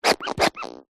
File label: leisure toy rubber duck squeeze no squeak